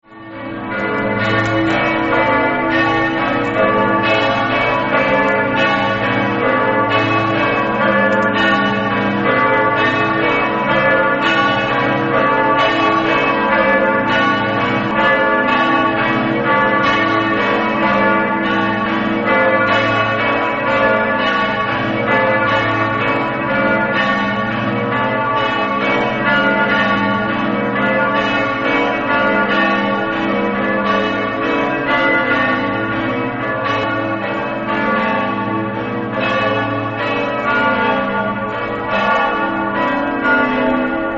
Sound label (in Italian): campane; udin